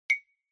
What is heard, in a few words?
Xylophone Multi